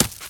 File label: gravel, footsteps infected run